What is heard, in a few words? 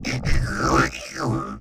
Effected Snarls, Vocals Humanoid; Sony Pictures Sound Effects Series Volumes 1-10; Sony Creative Software